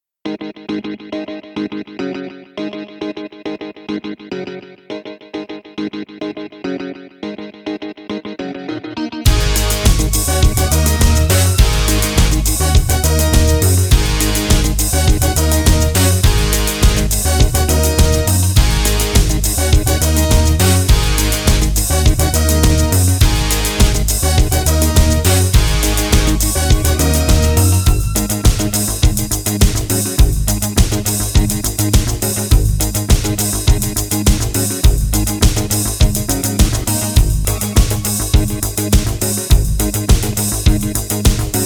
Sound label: Commercial Instrumental